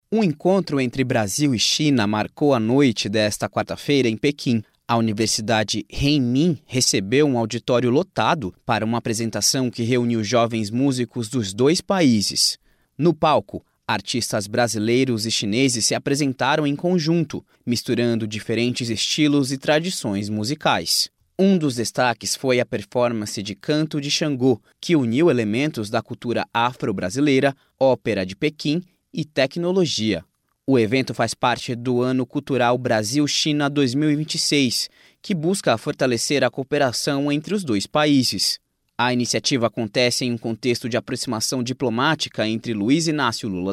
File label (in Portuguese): orquestra China Brasil